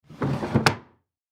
Chair Wood Slide, Chair Wood Slide Wood Floor Knock Over; Chairs; Household; Blastwave